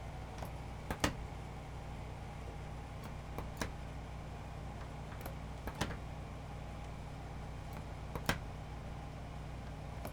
MECH GEAR Landing Gear, Aircraft Control Board, Boeing 737 Airplane Cockpit; Articulated Sounds